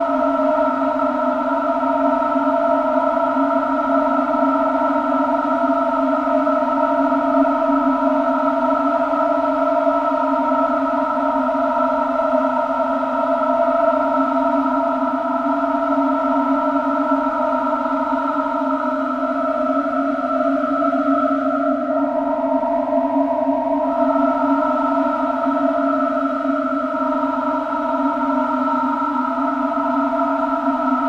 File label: Cage Chord; Pure Data